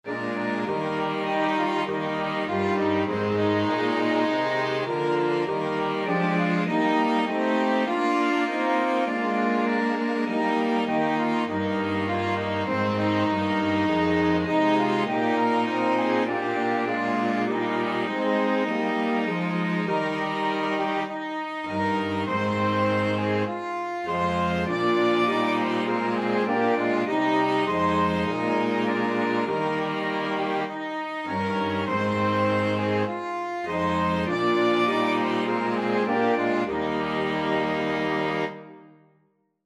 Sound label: flexible mixed ensemble, 4 players